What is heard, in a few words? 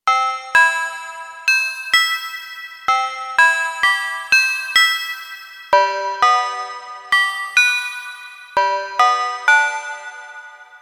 Oribells; Bank 2 card A